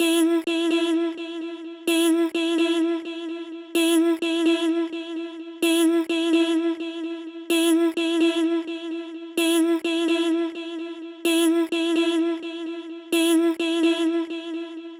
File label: voice fx, Key E, Bpm 128; Loop Nerds; Big Room House Allstarz